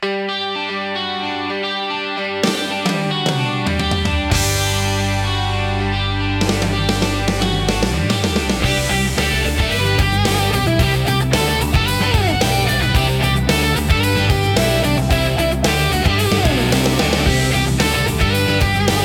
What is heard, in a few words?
Instrumental Jingle